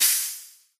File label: shaker burst; hippie female